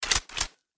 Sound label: mini14 switch, weapons